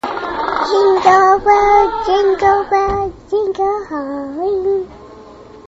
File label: sings